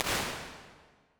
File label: 1.2s Church, Impulse Responses; Medium Spaces; Indoor Spaces; Apple